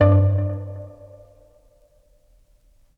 Polaris Space Delay Soft Vinyl Synths D2; vinyl keys

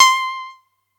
atari 520st C6

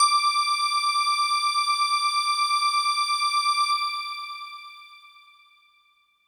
Hello Kawaii D5

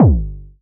KICK G; Pomp

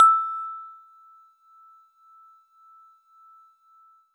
Warble Celeste DX100 E5

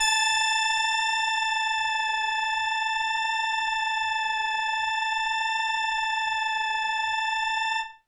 Indie Organ Kawaii A4

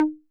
Conga Mid 808 Saturated B

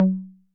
Conga Low 808 Sat; Saturated

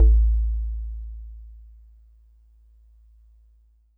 Warble Celeste DX100 A-1

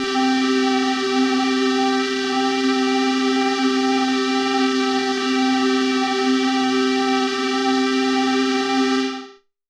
Wasp C3; 80s Threat

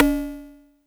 Rim Shot FX

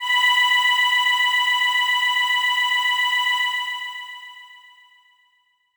Voices Kawaii B4

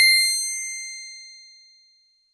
Easy Clav DX100 C7

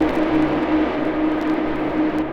Polaris Rave Full Vinyl Synths D#2; vinyl chords

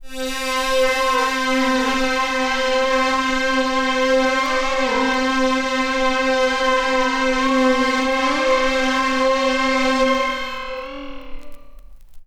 TOM String Movement Vinyl Synths C3; vinyl keys

